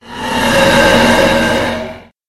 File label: monster hydra